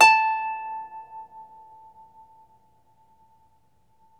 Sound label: Troubador Harp